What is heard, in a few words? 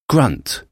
grunt